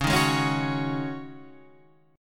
C sharp Suspended 2nd Suspended 4th, Guitar, Standard; strummed; 9,6,6,6,7,9